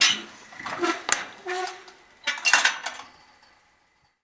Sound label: Gate Metal Fence Close; Doors